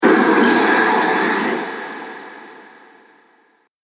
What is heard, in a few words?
Fantasy Cavern Impact; Miscellaneous Impact Sound Effects; Andrew Fleming